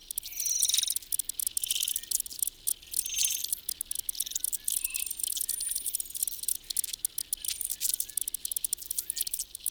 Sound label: Landscape Insect Loop, Stereo